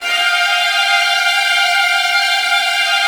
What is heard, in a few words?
VN TRILLS, Virtuoso Strings; ILIO; Kirk Hunter Virtuoso Strings VOL-1